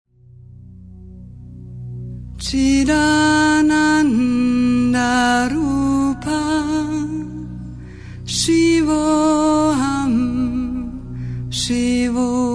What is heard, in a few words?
Gayatri, Mantpa; Yoga